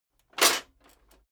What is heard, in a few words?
metal plate armor movement single